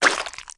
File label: poison splash